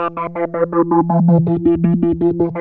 3100 E 4, Korg PS3100; Back In Time Records; Korg Universe VOL-1

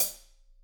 HH, Drums; Bob Clearmountain Drums I

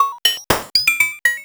POLYMOD; AMG - Vince Clarke Lucky Bastard

